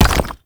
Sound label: Mech Step Surface Rock; Vehicles; Step Surfaces; Warframe; NechroMech